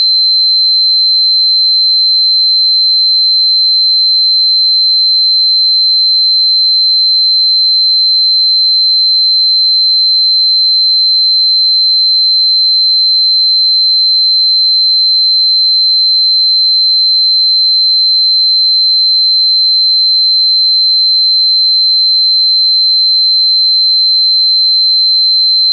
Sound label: 4096Hz pure tone; metatrons cube; 激活水晶陣天使頻率